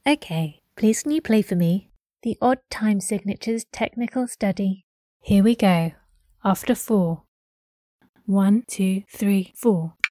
odd time signatures

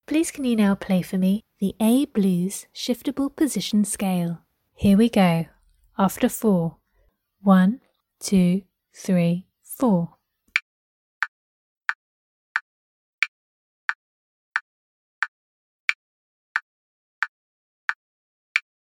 a blues shift

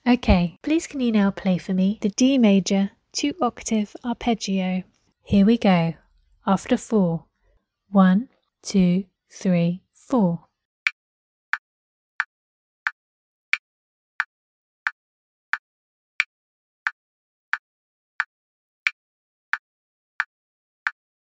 d major 2 oct arp

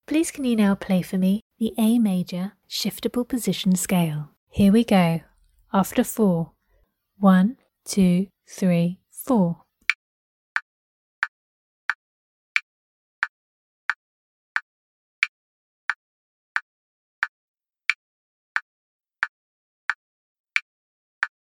a maj shift

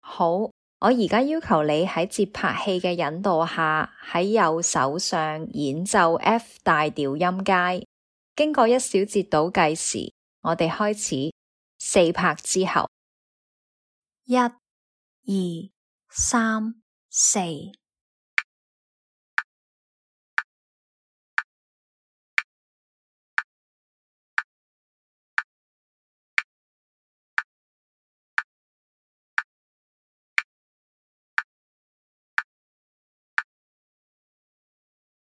f major scale rh

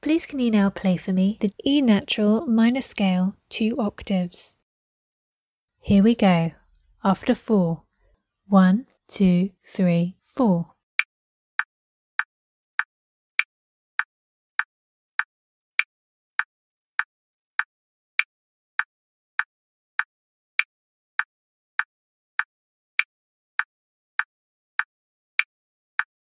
e nat minor 2 oct scale

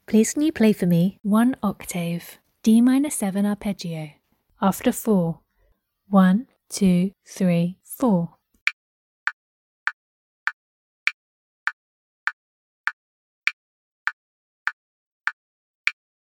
d min7 arp

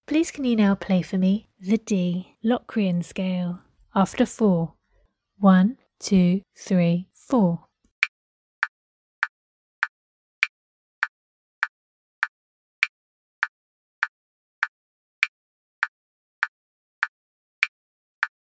d locrian scale

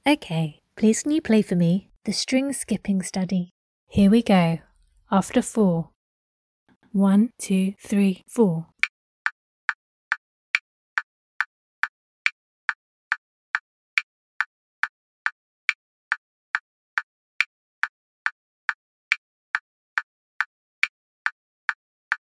String skipping

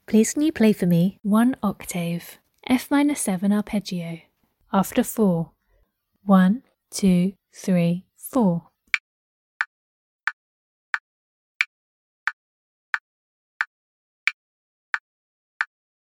f min7 arp

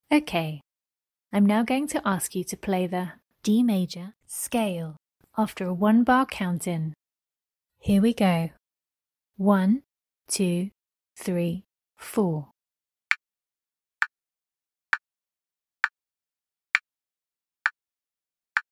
D major scale

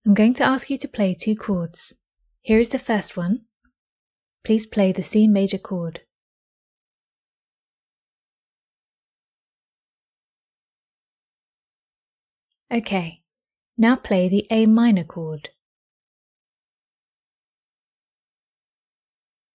Chords C Maj A min

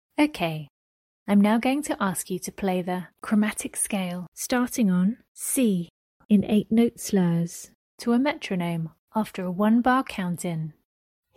chrom scale starting on C 8 note slurs

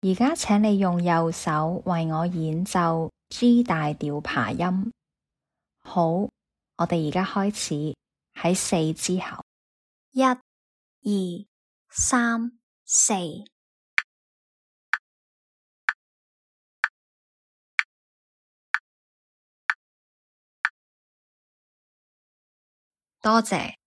G maj arp rh

